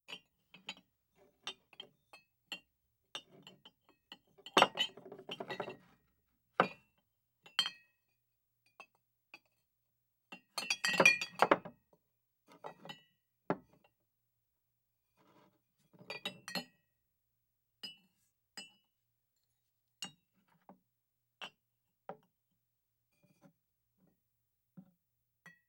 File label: Bar Movements; Fairlight Sound Lib